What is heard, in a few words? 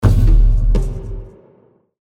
action drums stinger; crysis warhead